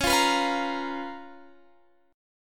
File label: C sharp 13th C sharp, Guitar, Standard